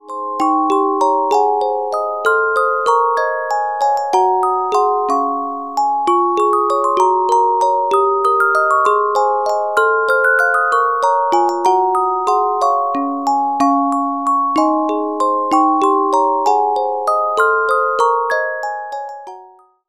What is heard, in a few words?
Carillon Music Box Melodies